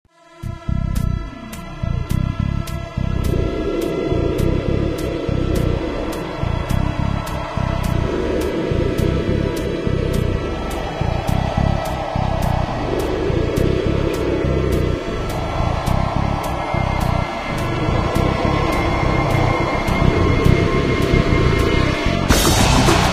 nuclear tech rock; svencoop

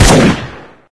eagle fire; weapons; svencoop

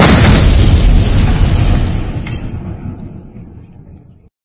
explode; svencoop

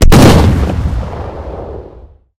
shotgun fire, weapons; svencoop

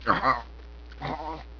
las death; svencoop; twhltower

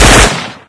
m16 fire; weapons; svencoop

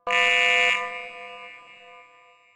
cam alarm; svencoop; twhltower

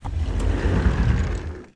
sliding door; svencoop; twhltower

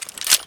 revolvers bullets in; svencoop; weapons

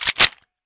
usp draw cock; svencoop; weapons

